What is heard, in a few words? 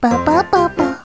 jingles